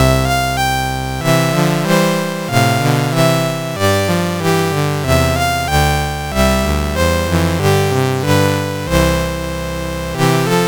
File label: Rustic Dance, CSG8580R5